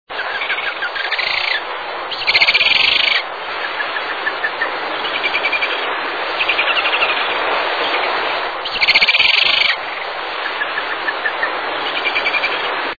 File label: Parakeet Auklet